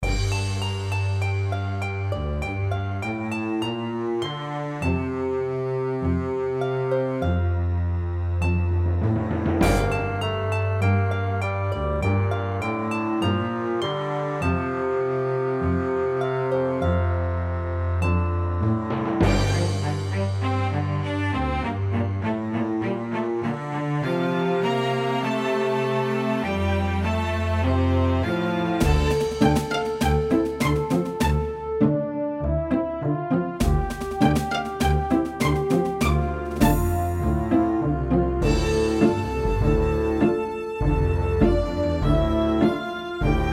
Victory, Music; Age of Empires II - The Age of Kings (PC)

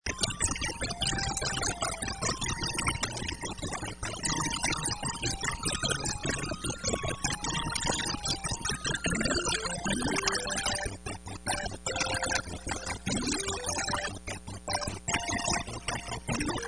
Toilet Flush; Protoman Museum

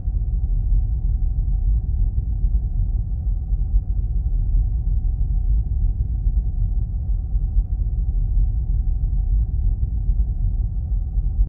Ambience; Negotiations; Lego Star Wars (PC)

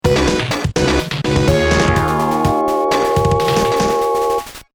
Victory, Music; Gunstar Heroes; Dice Palace

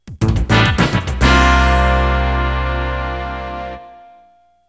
Failed Bonus Stage; Super Smash Brothers

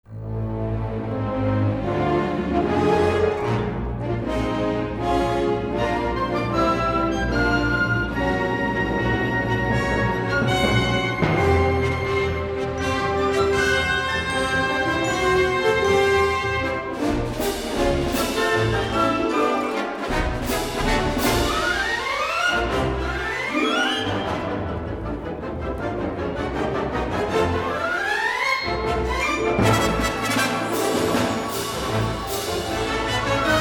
Victory, Music; Star Wars Galactic Battlegrounds

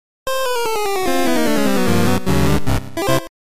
unknown fanfare; Pokemon TCG

